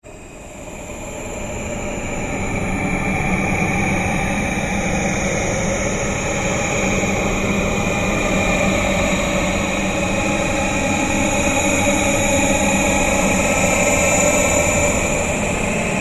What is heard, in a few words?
Ambient Drone Slow Sweep; Sound effects created by manipulating other sounds; Drones (Sound Effects:Sound Design)